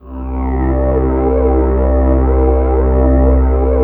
pad, Drum & Bass, 160 BPM

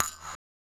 Tap Sweep; Zero-G Smoke

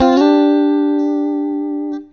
STRAT GT; Synclavier Sampler Library; KEYS AND GUITARS

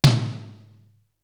Tom, Drums; ZERO G - Datafile DISC 3

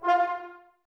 BRS; Miroslav Vitous; Woodwind Brass Ensemble

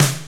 SNR FAT, snares; Roland L-CD701; Snares 5; Sn Modules 5

